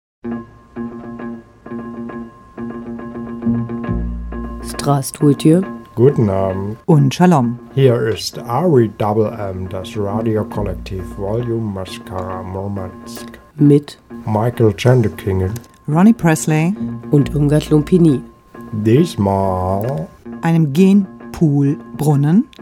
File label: Jingle